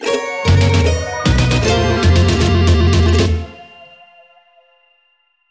Transition

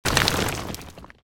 Maggot Enter Ground; The Binding of Isaac; SFX Monsters

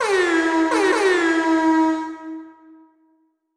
Air Horn

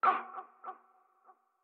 Hurt; StyxMech; Going Under

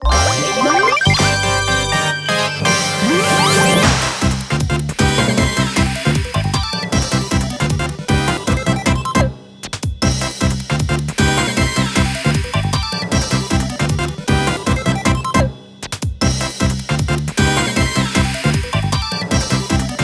Goal; Pac-Man & Galaga Dimensions; Nintendo 3DS

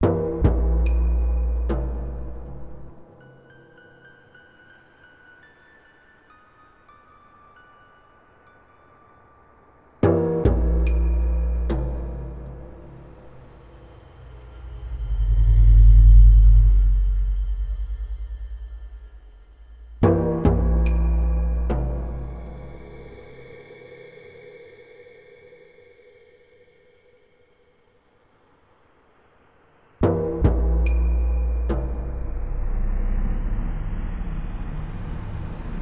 Amb Outdoor; The Hobbit; PS2

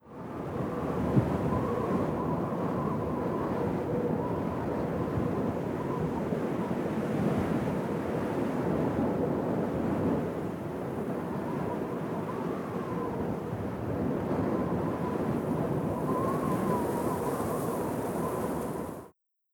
Wind

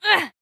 Overhead Swing; Jackie Overhead Swing Final

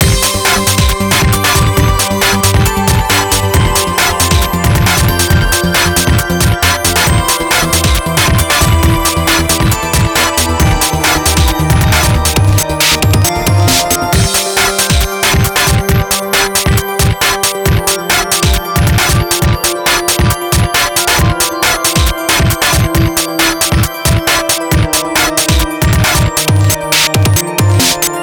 Congrats; beatmania IIDX 28 BISTROVER; System BGM

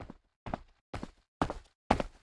Walk Come One

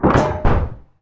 Cruiser Hit Obstacle; lethal company

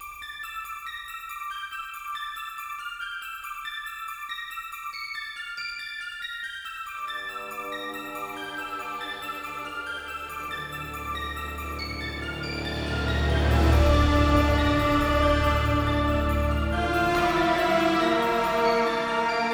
Sharp Laughter Instrumental Menu Theme; Clock Tower Rewind

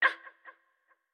Skeleton Hurt

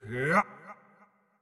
Wind Up Short; Marv

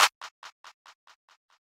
Big Clap; Patella

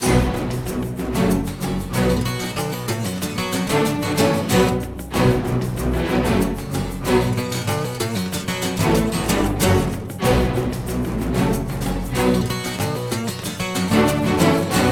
Combat; The Hobbit; PS2

